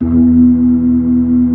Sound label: B-3 C306 40 L, Keyboards of The 60's and 70's; Full Xfade